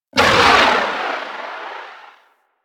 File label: Artillery Barrage Explosion Far; Weapons; Powers; Warframe; Archwing